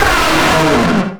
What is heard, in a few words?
STAB